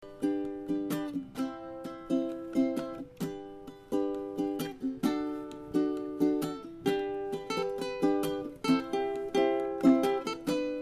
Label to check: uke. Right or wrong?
Right